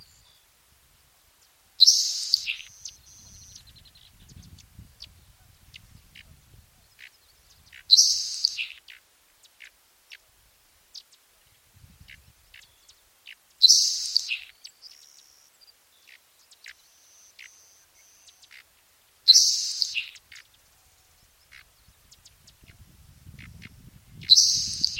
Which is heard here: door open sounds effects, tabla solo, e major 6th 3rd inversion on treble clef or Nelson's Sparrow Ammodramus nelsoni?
Nelson's Sparrow Ammodramus nelsoni